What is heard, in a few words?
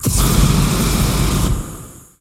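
Flame Thrower Short Burst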